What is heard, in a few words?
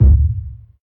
round bass drum single hit D# key, Royality free kick sample tuned to the D# note